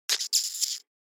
Rat squeak

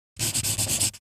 Pencil scribbling on paper

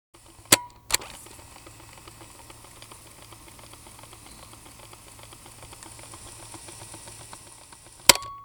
Cassette tape player fast forward or rewind

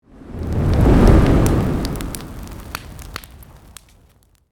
Fire whoosh transition; cinematic

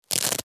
Bulletproof vest straps velcro fasten; military equipment sound effects

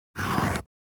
Pencil underlining on paper quick swipe

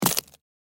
High heels single step on broken glass

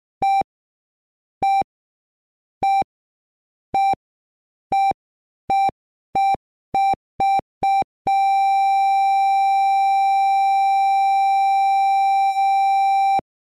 Emergency medical kit heart monitor flatline tone

